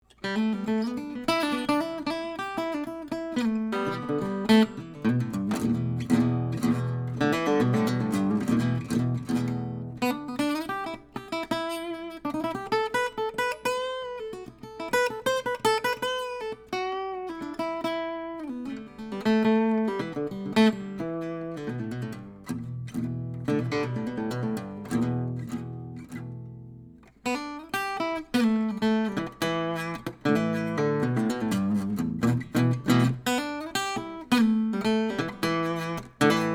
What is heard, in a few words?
Boogie, Gibson L50; Original in E